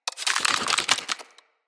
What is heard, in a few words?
Root Spider Mother Foley Spin